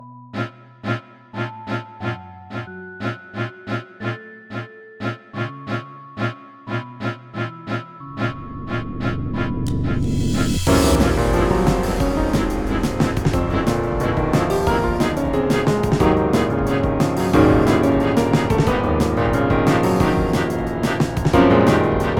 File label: battle theme